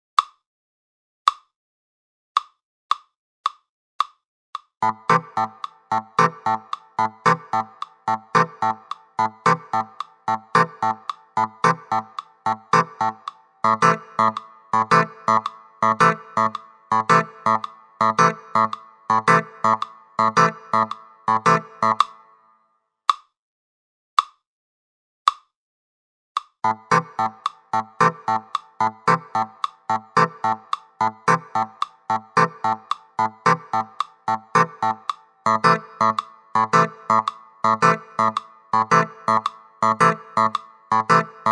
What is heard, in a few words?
BUBBLE, Batterie Reggae; Buchmann; Batterie Reggae VIbrations